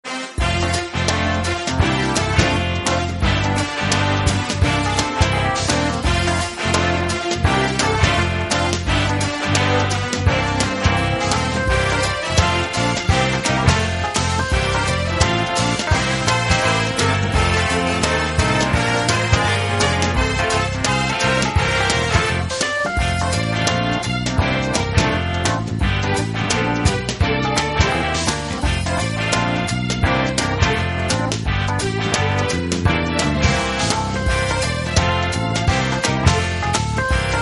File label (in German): FRANKENLIED Lied der Franken Swing